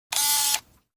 camera; retinz

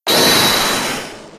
shout; gunvolt

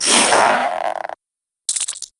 snake content long; stroganov

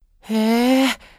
eeeeeh

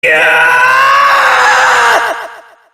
scream; adachi

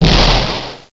damage

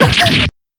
damage; nemu

